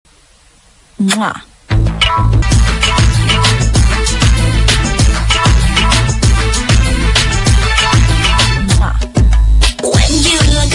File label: Kiss Sound